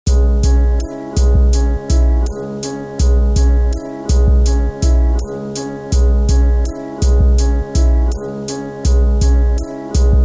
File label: beats